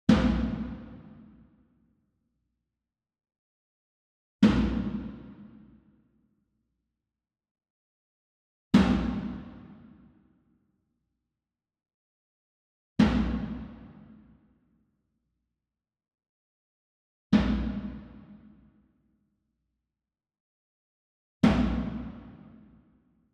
SMALL DRUM Tabla Hit Hard Beater Far; boom library; Cinematic Strikes Construction Kit

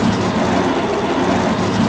Metal Grate Scrape Loop; Doors

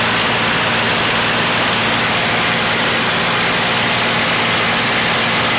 Plane P40 Inflight Loop; SFX Kit; Airplanes

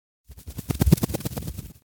Bird Wing Flaps Away - Foley; Hollywood Edge; sound designer's toolkit v2

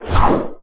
Big Whoosh Quick; SFX Kit; Foley - Misc

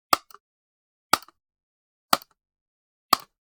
Generic Button Plastic Small

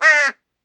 crow call; DeadFright

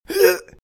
hurt; RadwinIsland

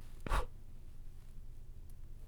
Match Blow; blindside

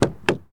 Truck Dodge Ram Door Open; Trucks

